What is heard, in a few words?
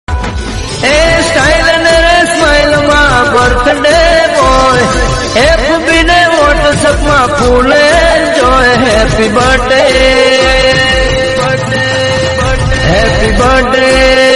Happy Birthday Gujarati Song